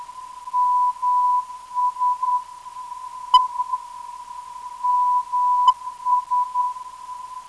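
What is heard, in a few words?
275kHz, non-directional beacon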